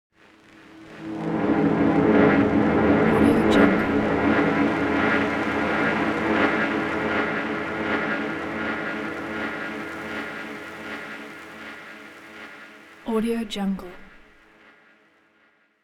cinematic scrapes grainy reverb

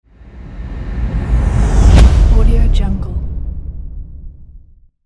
impact boom transition

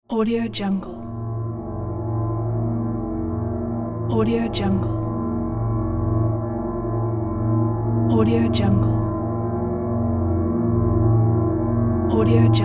scifi space chamber

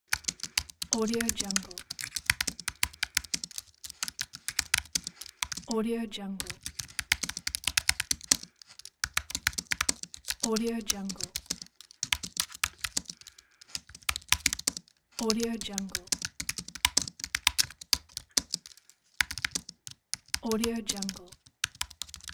computer keyboard

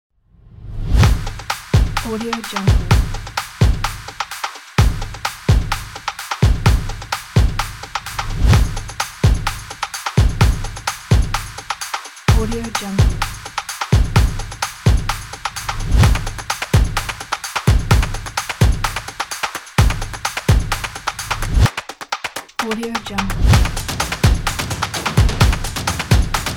symphony percussion

